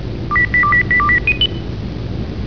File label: chime; Zathras